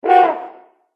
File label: dragon mage wound